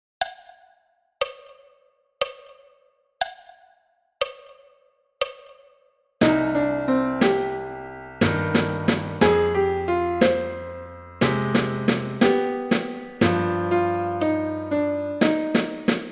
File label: Etude tutti a 60 bpm, percu